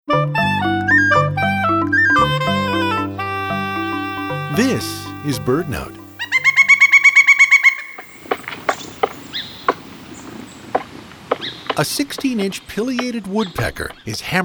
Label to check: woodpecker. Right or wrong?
Right